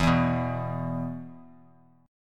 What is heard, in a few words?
E 5th, Bass Standard; strummed; JGuitar